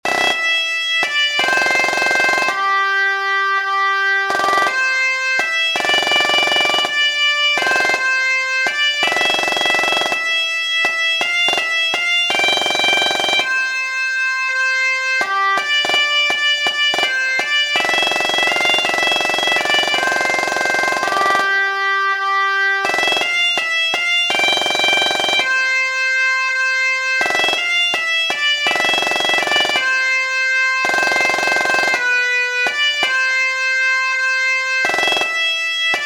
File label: snare, drumming; drumming materials; highlanders music